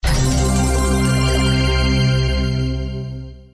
enter; slots; sRoma

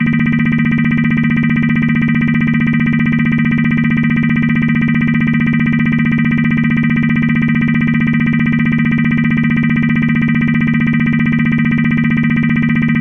win incrementing; Crazy Mk Dx

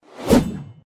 bonus man fight empty; slots; sRoma

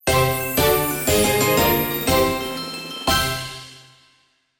board chest pick result

